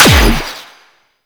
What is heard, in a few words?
Air Pistol 2D Shot; Crp Air Pistol